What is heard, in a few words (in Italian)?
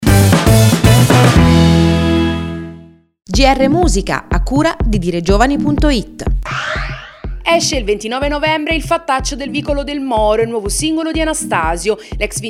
musica